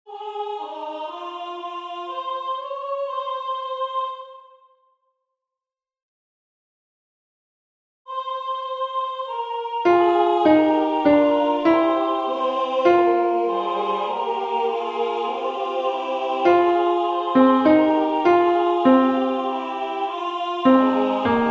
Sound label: Ave Maria Tenor; Victoria